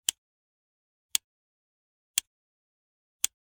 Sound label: Generic Button Metal Tiny; boom library; The Interface